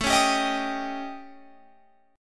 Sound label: A 7th Flat 5th A, Guitar Open F; A7b5 strummed; 21,20,19,22,22,22